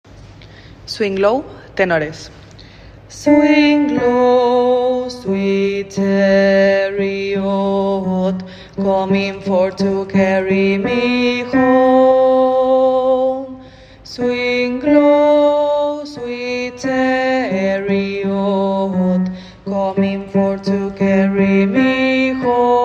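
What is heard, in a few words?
swing low tenor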